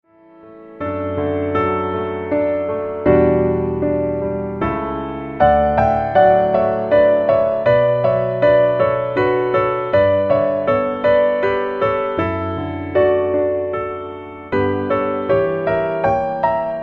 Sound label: Away In A Manger (Pno Low); Piano - Low